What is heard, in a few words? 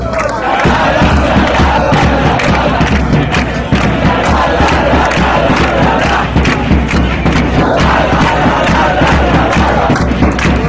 dale, chants; cavese